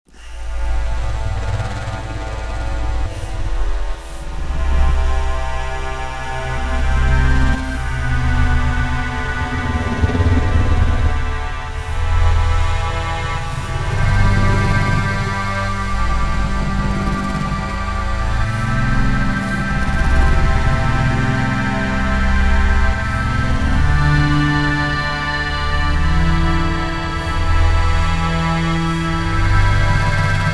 SteamPunk alt Victorian steam synth Kontakt instrument